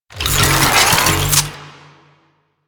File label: Short Bow Charge; Warframe; Duviri; Dax; weapons